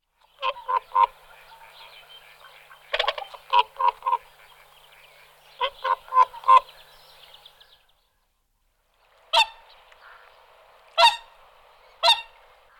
Labut zpevna Cygnus cygnus

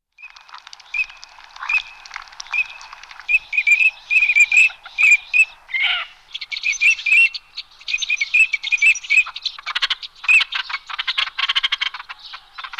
Cirka obecna Anas crecca

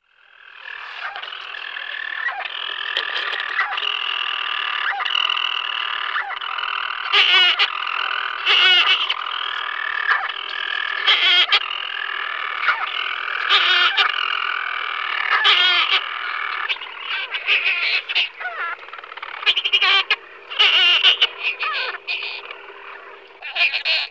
Burnacek maly Hydrobates pelagicus

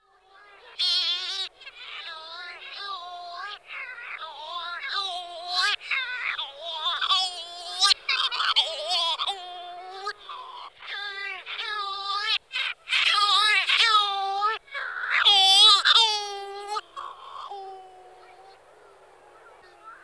Burnak sedy Calonectris diomedea

